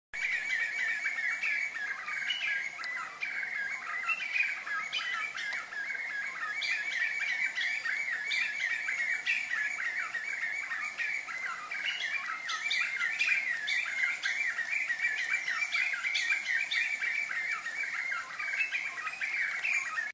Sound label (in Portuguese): Canto do chora chuva de asa branca